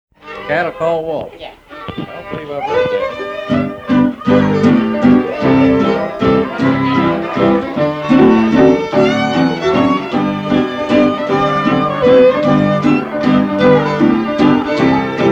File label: Fiddle Tunes